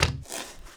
drag step; Doom

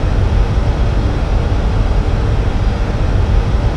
machine ambience; Quake 4 Alpha; id software

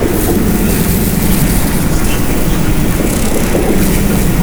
bubble machine; Quake 4 Alpha; id software

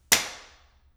table foley; DoomRTX

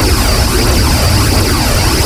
tank missile loop; Quake 4 Alpha; id software

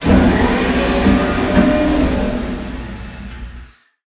cargo doors open; Quake 4 Alpha

